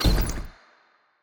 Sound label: projectile; Shelter